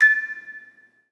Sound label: musicbox